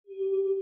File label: gem Pulse; netent